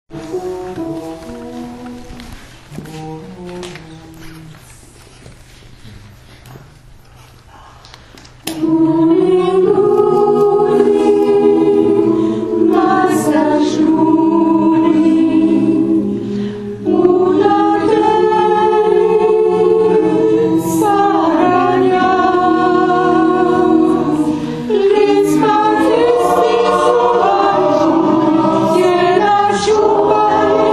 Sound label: LATVIAN LULLABY